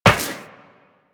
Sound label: Run Metal Perforated, Footsteps; Warframe; Tenno